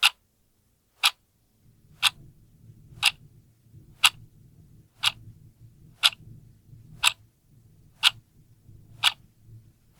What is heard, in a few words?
Ambience Interior Bedroom